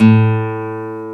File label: NYLON A 1, GUITAR; AKAI S-Series CD-ROM Sound Library VOL-8